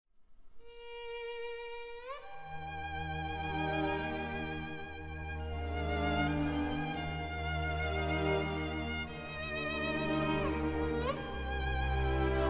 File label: Nocturne in E flat major; chopin